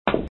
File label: wall; bola8